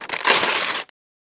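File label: Paper Rip Short Hard; paper mvmt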